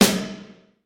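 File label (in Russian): соло на барабанах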